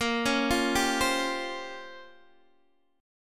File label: A sharp Minor 6th Add 9th A sharp down Guitar DADGAD, chord